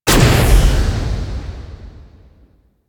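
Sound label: Sundering Dissipation Projectile Explosion; Warframe; Focus; Unairu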